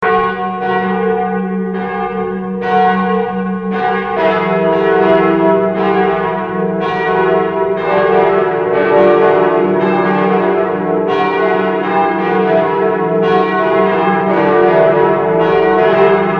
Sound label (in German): glocken st martinus kirchherten; Seelsorgebereich Stadt Bedburg